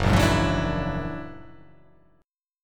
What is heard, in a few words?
F sharp Augmented 9th